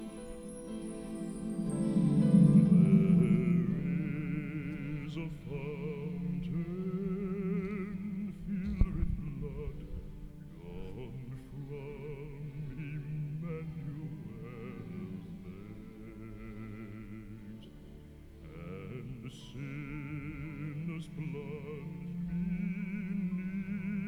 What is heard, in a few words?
THERE IS A FOUNTAIN BASSBARITONE